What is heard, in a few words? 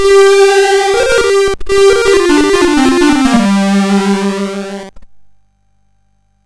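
Operation Hormuz, MOS 6581 SID chip music, games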